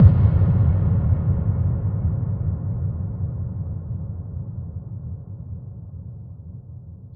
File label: electric explosion; Crash Bang Boom